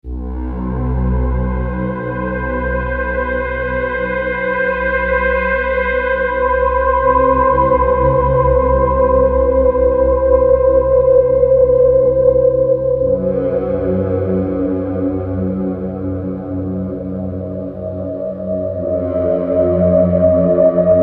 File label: stinger soft